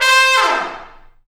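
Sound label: BRS TP FAL, Brass Trumpets FX, Trumpets Falls; Tps Falls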